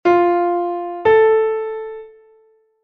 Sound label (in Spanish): 3ªmayor Fa3 La3; intervalos musicales; ¿Qué intervalo suena?; 3ª Mayor ascendente